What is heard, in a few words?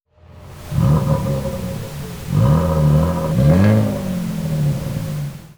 Rev pink